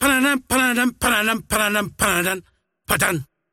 singing; dota; largo

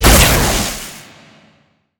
plasma field impact; weapons; dota; razor

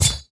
metalblade pickup; ui; inventory

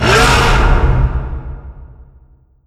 primal roar; weapons; dota; beastmaster

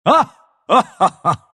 pangolin laugh; dota

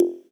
chat message rec; ui

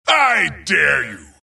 death long; dota; skeleton king

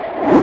preattack; dota; enigma

